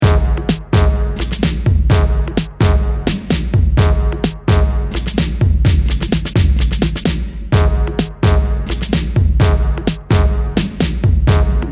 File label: Percussion